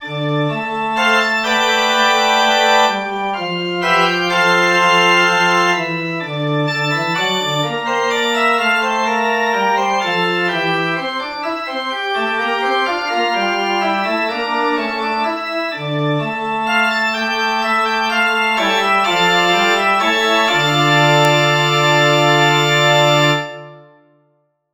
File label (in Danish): forspil